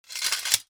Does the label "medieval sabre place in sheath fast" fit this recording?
Yes